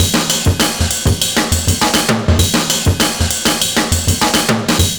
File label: RIDE IT FILL, loop; Univers Sons - Kitch 70s